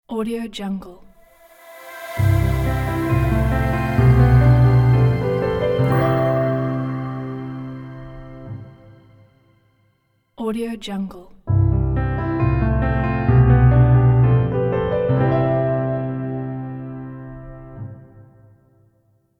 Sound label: audio branding opening logo; yellow-hedgehog